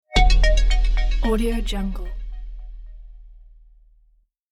logo minimal tech

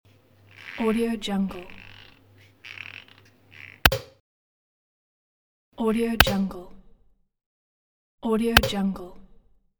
open wine bottle

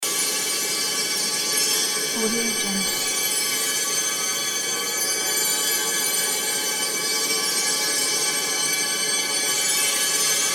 magic chest loop

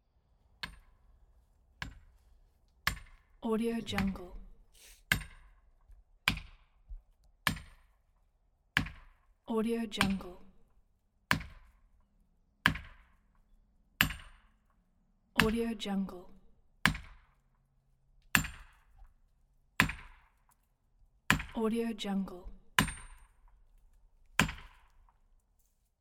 driving wedge into tree; applehillstudios

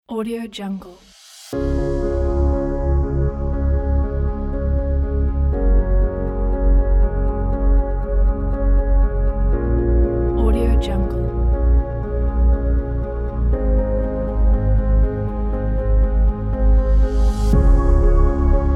emotional motivational epic trailer

